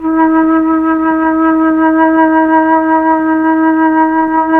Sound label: FLT, Melotron Flt, Tron Flutes; Roland LCDP09 Keys of the 60s and 70s 1